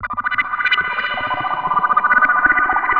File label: SYNTH D+B 160 C, Drum+Bass, Keys; eJay